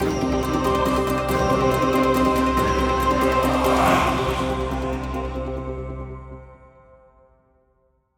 Player Defeat End

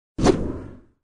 Sfx Enemy Knight Attack

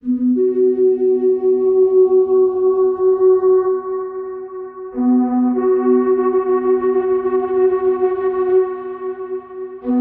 music shofar

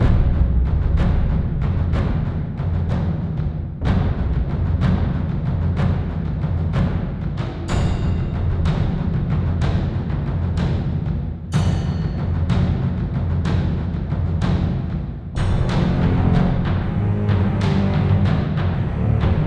tension solo perc loop; noahmund; ardalia

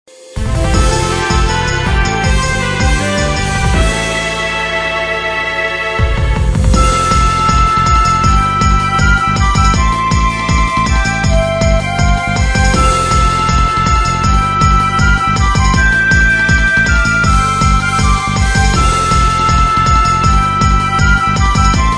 Fanfare; Valthirian Arc

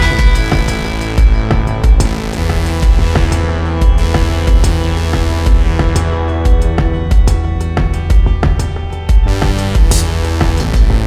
loud music; Shadowrun Hong Kong Extended Edition

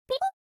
beepbeep; One Night Hot Springs

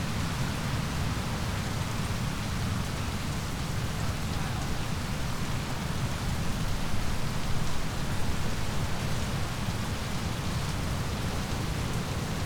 outdoor rain heavy; teardown ps5 windows xbox series xs gamerip 2022

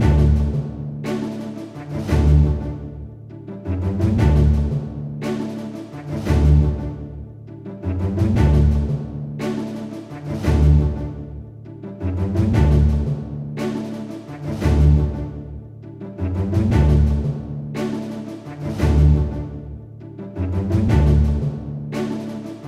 Dungeon Treasure Loop

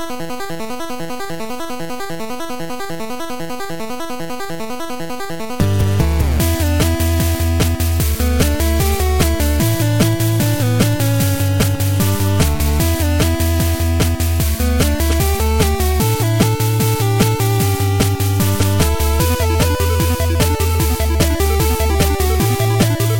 Energetic Fist

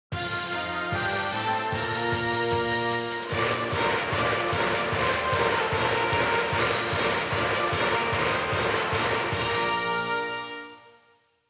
Ouen Brassband; ebaseball powerful pro yakyuu 2020 ps4 switch gamerip 2020

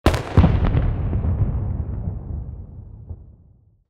firework; teardown; ps5 windows xbox series xs

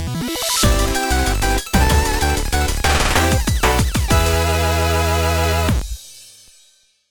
Run Complete; holocure save the fans windows gamerip 2022

